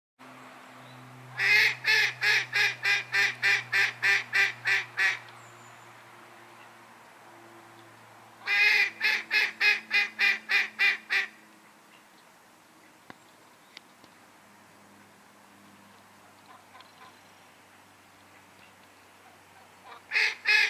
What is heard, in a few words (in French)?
LE CRI DU CANARD COLVERT FEMELLE QUI CRIE